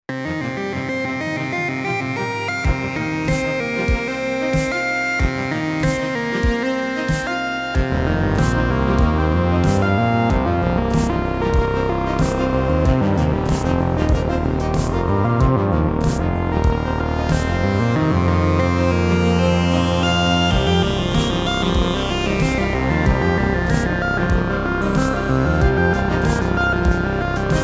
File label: fract osc; my first fract osc track; raw fract osc; weeklybeats 2014